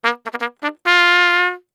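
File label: trumpet; Smeshariki Cartoon Studio; Trampet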